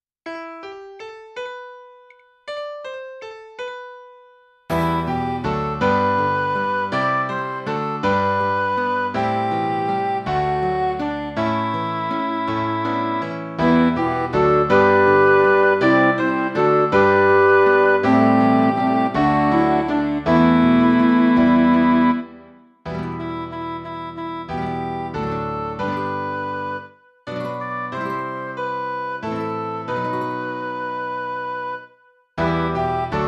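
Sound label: gospel acclamation ash wednesday chant midi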